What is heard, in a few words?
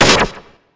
Sci Fi Laser Gun